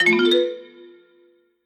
Rackup Loop End; Wu Xing

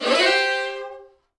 negative pop up; Skillzgaming; mega Money Rush Desktop

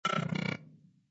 gamble lose; Rabcat; Classic 243

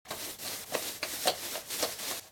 Bonus Transition Intro; Wu Xing

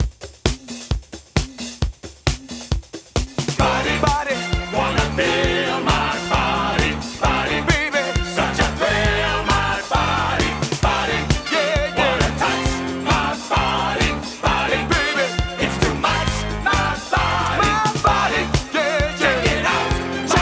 Macho Man Music Free Spins Loop

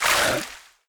obstacle underwater

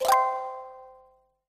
cluster tap; Skillzgaming; fruit Blast Desktop

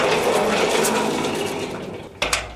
door Closing Sound; loki Wild Tiles Desktop; Ingenuity Gaming

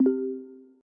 free Games Scene Sounds; Star Dust

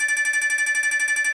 Base Tally Loop; Wicked Tales Dark Red

